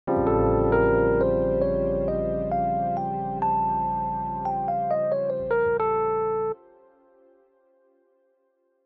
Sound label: Altered Scale